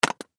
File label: Button Switch; Multimedia Web Interactive; Buttons (Sound Effects:Multimedia; Blastwave; Technology